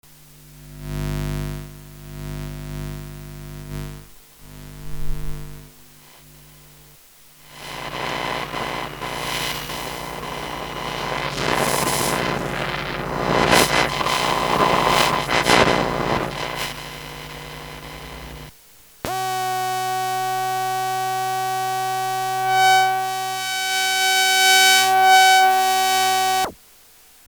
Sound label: coil pickup